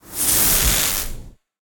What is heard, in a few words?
Electricity Buildup; smite; god merlin